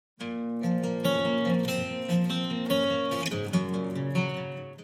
Acoustic

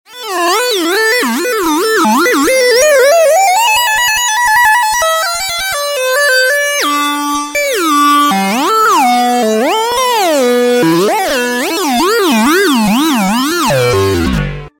Wake Up Alarm; Handy

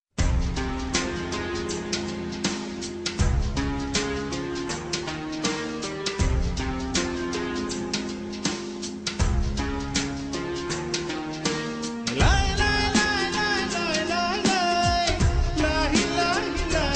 Tone

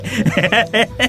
Laughs

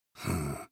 Hmm; Geralt

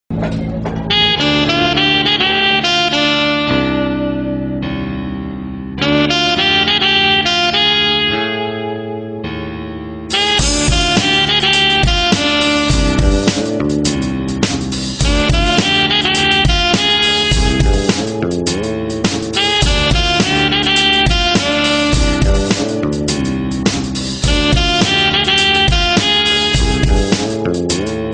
Sax

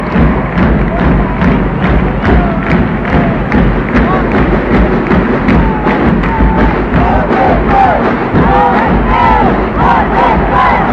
Cheer

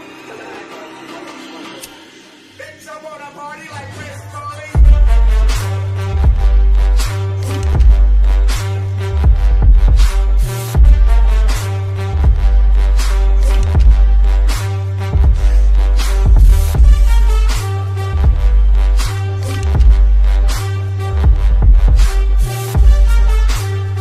bass boost

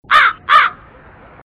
Crow Sms Tone

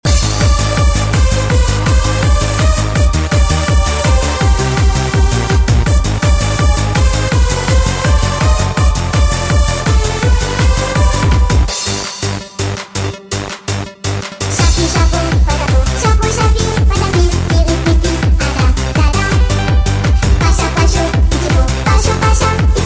Techno

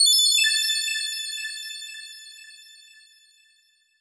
New Bell Sms

